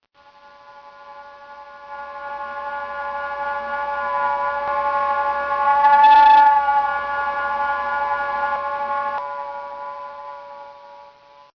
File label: wind harp